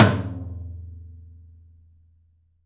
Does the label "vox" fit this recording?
No